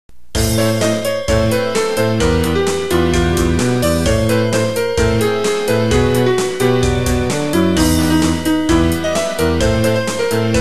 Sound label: piano